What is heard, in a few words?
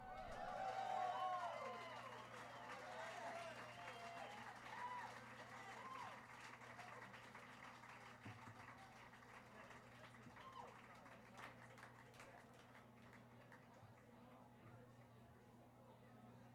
prog rock kinda thing; Martyrs Chicago IL USA